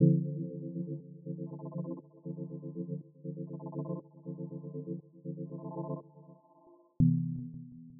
Synth loop (120BPM); cinematic drama; Loops; musicradar

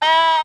Goat, Yamaha DD-35

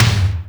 TOM D, Drum Dry; East Collexion; Drum 1

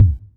Kick, Roland MV8800; PLUTO KIT

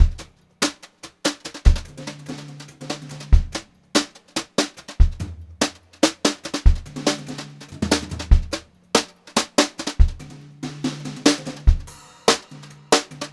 144 vari, Brushed Drum Loops; USB Soundscan vol.39; AKAI

